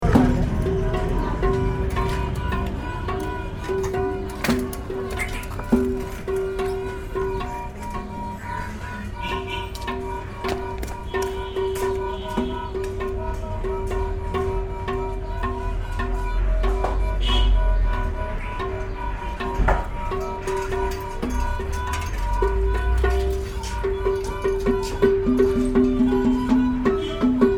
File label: tuneup; thamel; nepal